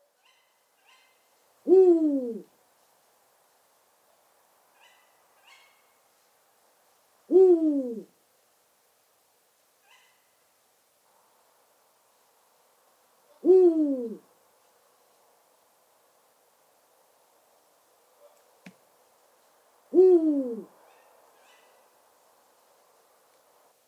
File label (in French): grand duc